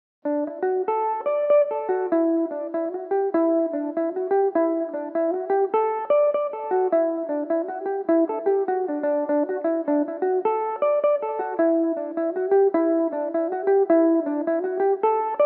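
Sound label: D major, Irish mandolin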